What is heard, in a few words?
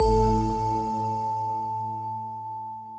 prize bonus; Great Book Of Magic Deluxe; Wazdan